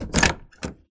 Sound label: Door Open; doors